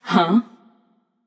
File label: confused passion; chaac